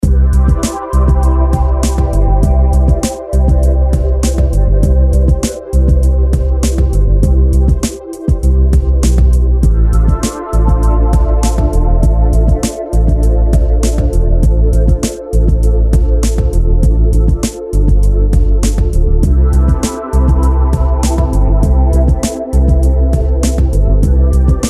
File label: groove